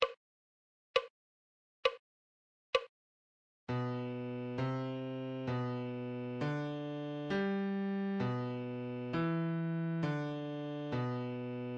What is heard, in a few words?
musical reading in bass clef Adagio